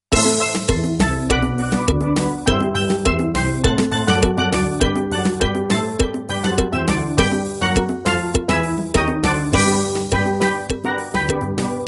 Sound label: Bassline